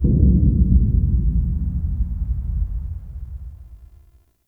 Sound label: Distant Metal Bang; Ambience; Warframe; Corpus Railjack